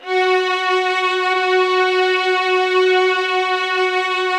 F#4, LOUD VIOLINS; Q Up Arts; Denny Jaeger Master Violin Library

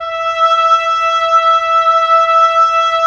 Oboe; Roland - LA Composer vol. 4

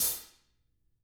EDGE, Drums

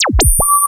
noise, FX & ATMO, ALARMS & ALERTS

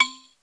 TAMBO TACK, drum; Crypton - DrumBase5000; WS DRUMS

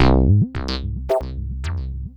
UR 303, 303 loops; Sonic Foundry (Sony Creative Software); Ugly Remnants VOL-2

